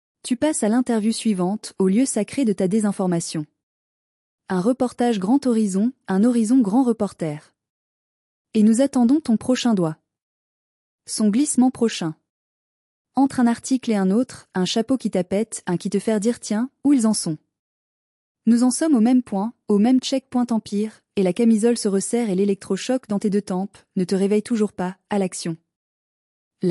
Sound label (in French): veloute de hautbois; avec bequille